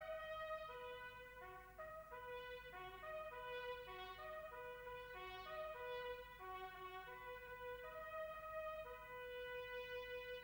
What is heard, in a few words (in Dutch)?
Last Post, trompet